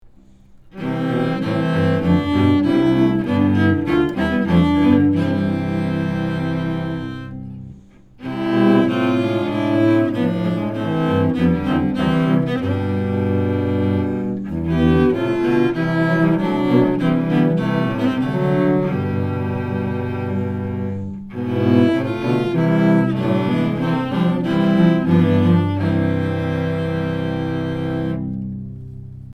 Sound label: Chorale